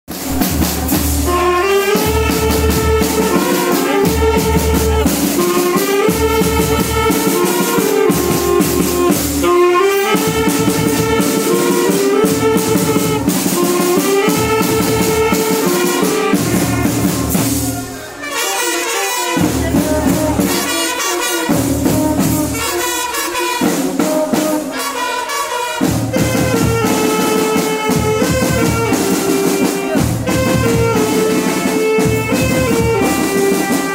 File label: rocking march, instrumental; vajihi scout